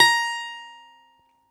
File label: BALALAIKA, Prima Balalaika, Plucked Strings